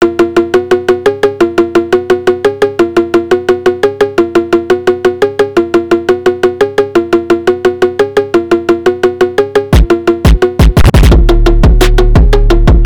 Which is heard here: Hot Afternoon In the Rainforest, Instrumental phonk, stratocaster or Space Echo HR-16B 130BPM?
Instrumental phonk